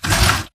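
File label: Stalker Movement Servo Heavy Short; Titanfall 2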